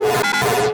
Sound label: Sci-Fi Electronic Distorted Beep; Science Fiction